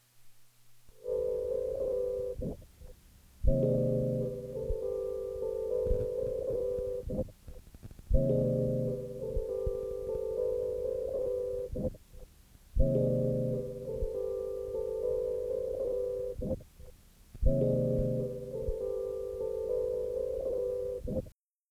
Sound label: tape loop